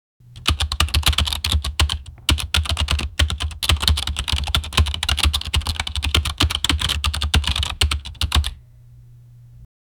slime keyboard

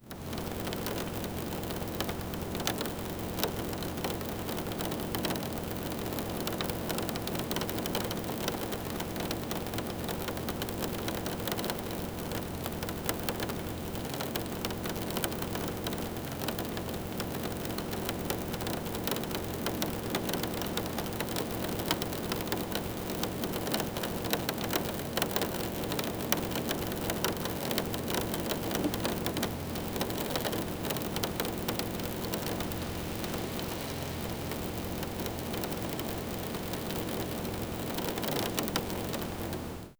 soft rain hitting the win; shaky

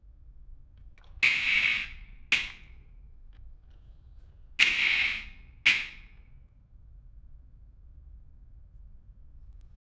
sankh sound, wind instrument

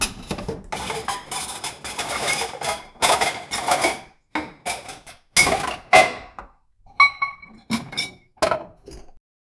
a child rummaging through

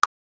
click ui

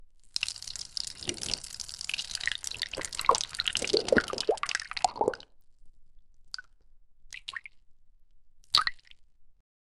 buuurp hiss drip rotting bo, body horror; sound effect

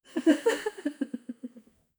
crowd

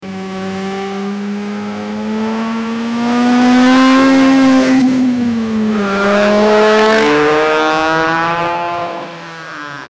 a racing car driving fast

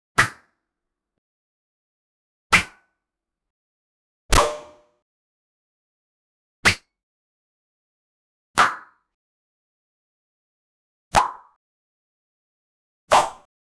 tap tap splash fwip

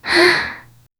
a single breathy ha

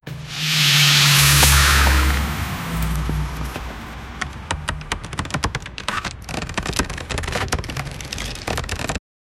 accident sound effect

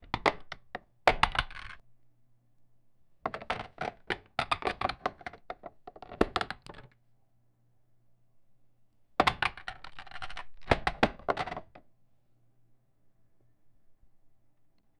chest opening video game

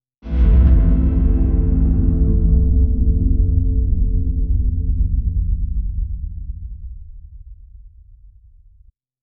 string instrument bass, earthquake

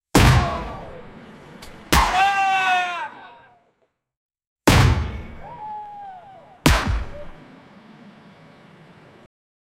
quick ball thuds and scra, soccer

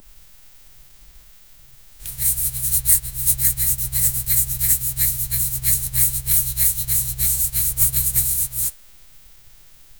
brush strokes, painting

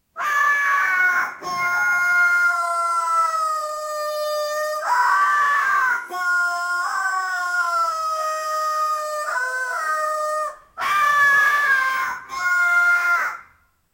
the cry of the orc, battlecry

